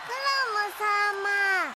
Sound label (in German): Frosch